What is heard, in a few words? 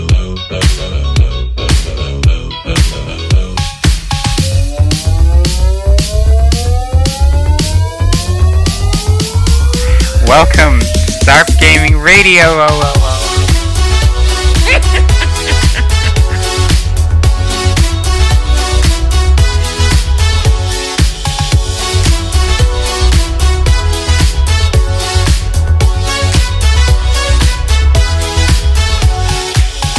voting music; deathrun